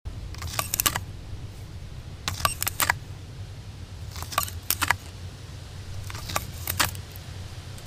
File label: Scrunchy